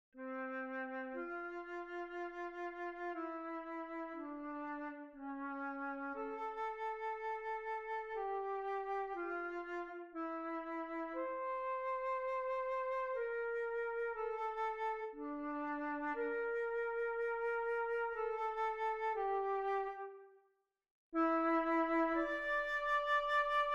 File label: Concert flute